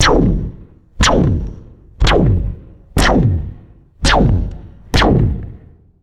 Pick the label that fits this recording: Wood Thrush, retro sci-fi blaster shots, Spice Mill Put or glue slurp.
retro sci-fi blaster shots